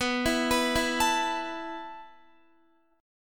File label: B 7th Suspended 4th B down Guitar; B7sus4; arpeggiated